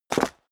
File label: step high heels concrete grit jump; schritte; Footsteps